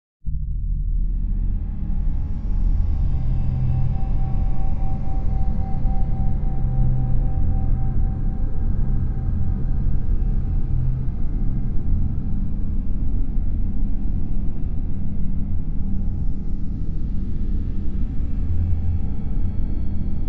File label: Bed Cold Dank Drone Sh